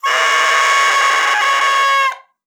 OBOE3; Xsample VOL-6

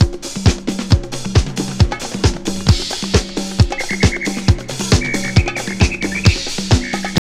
house loop

